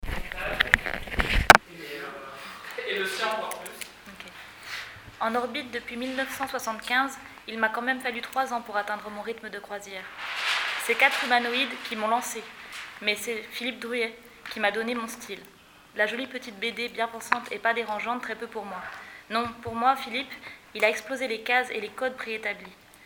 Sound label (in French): Metal Hurlant